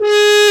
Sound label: BRS F HRN, French Horn Sh; Roland L-CDX-03 Disk 2; F.Horn 4 Sh